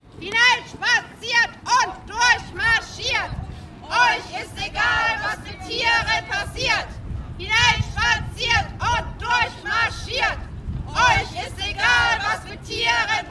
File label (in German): demonstration gegen zirkustiere protestrufe; vor circus probst